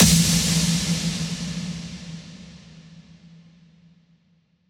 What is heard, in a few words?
Snare FX F